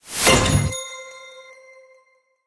score medal

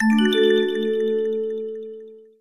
level complete

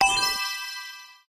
play button

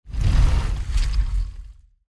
transition sound

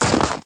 ball; snowman; icecream

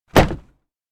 crate Hit; game

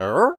touch people

